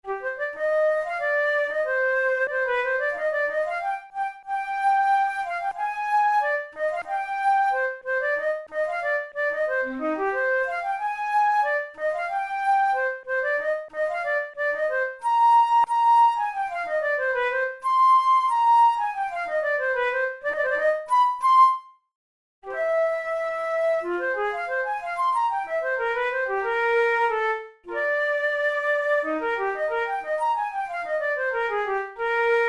rondo, flute

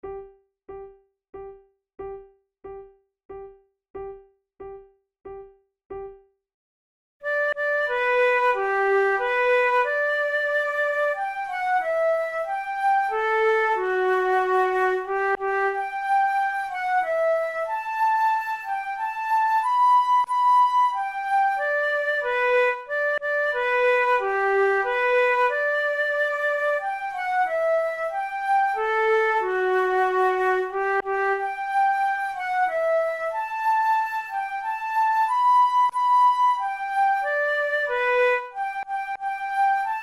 duet

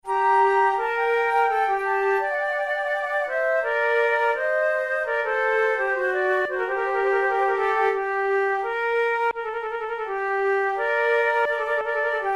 two flutes sarabande; Monteclair; 6 concerts; flute tunes

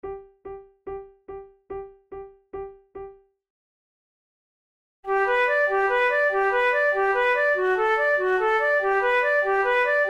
duet, flute

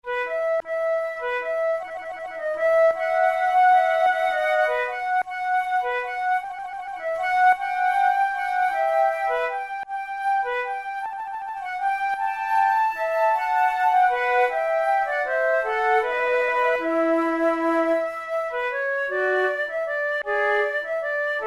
two flutes; Monteclair; 6 concerts; plainte en dialogue; flute tunes

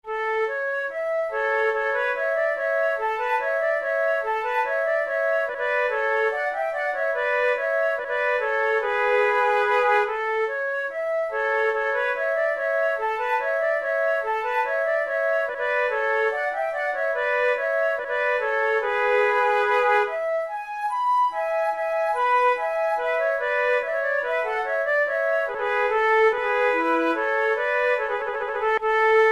menuets, flute

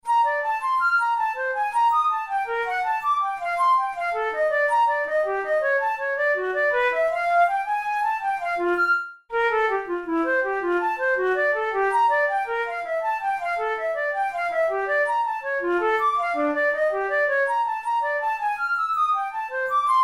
studies for flute solo; karg-elert

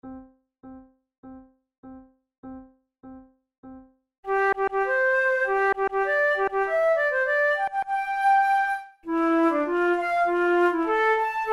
duet in c major, flute; kohler op93 no2 duet no2

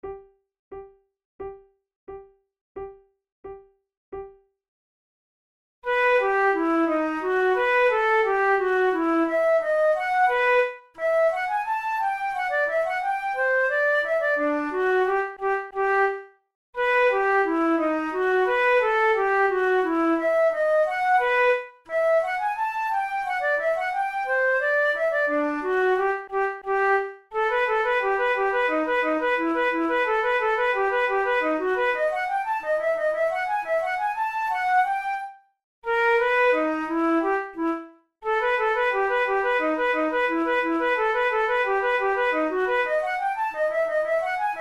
fantasia per flauto solo in g major andante mosso; mercadante

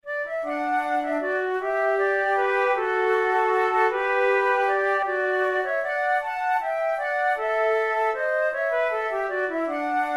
methode de flute; tulou

